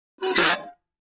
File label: Metal Scrape On Metal